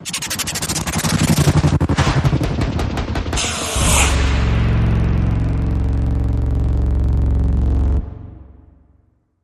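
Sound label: Hit Sttr, stutter hit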